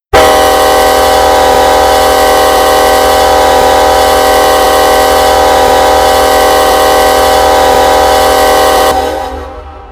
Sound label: k5lla, horn; run 8 v3 horn pack